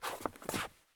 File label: stop wood; Escape from Tarkov